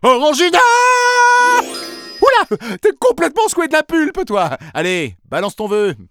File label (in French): Orangina secoue de la pulpe